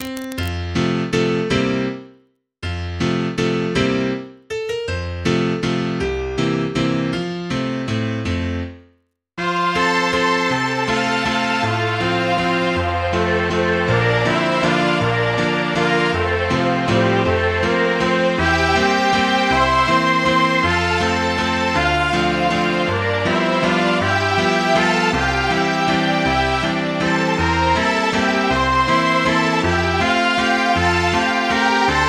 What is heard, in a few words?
daisy bell